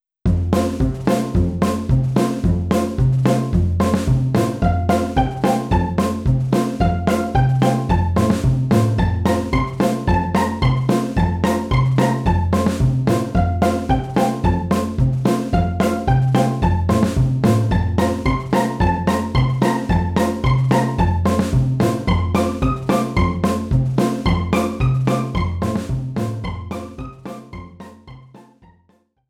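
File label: background music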